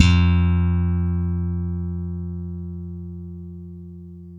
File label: SLAP F 3, BASS; Spectrasonics; Bass Legends CD 1